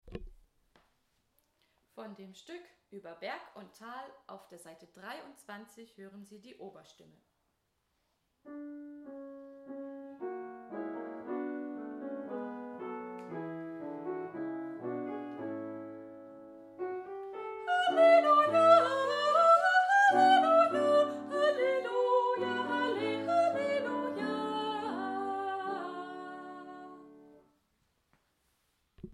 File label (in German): Ueber Berg Oberstimme